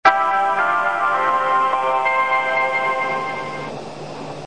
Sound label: bus chime; 福島交通; チャイム２は郡山支社の車で流れます。